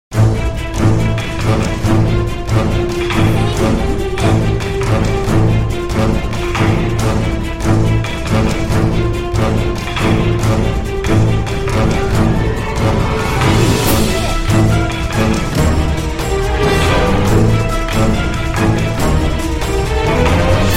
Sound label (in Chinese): boss 危险 紧迫; 三国杀传奇